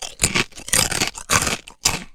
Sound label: human eat; theodyssey